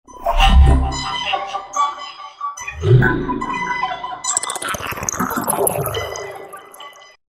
Roland V-Synth; Energy Moves; Factory Patches

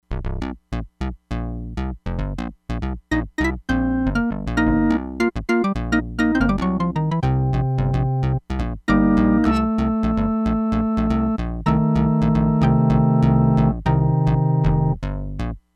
CLAVINET - ORGAN, Crumar Bit 99; Factory Programs

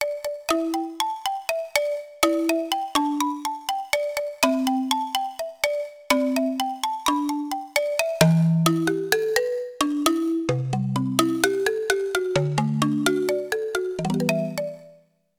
Ethnic Logs, Roland JD-800; Factory Patches

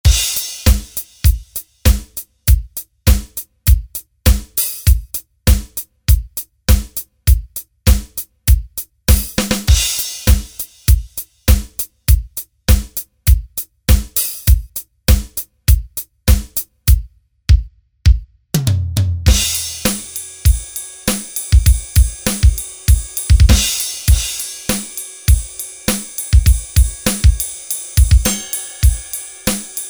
Acoustic

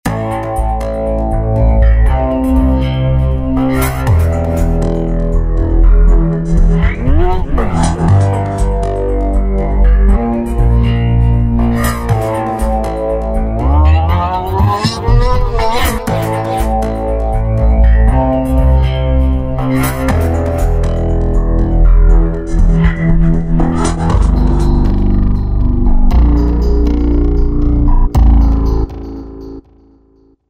Outsider, Roland V-Synth

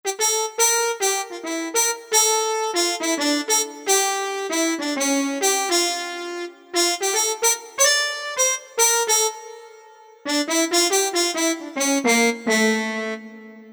3 Trumpets

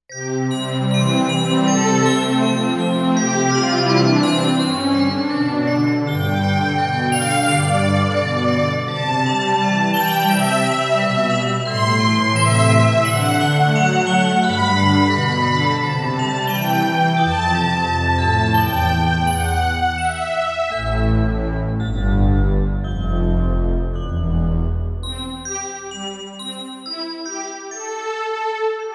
Warm Bells

